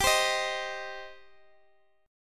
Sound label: G Suspended 4th Sharp 5th G down Guitar Standard; strummed; JGuitar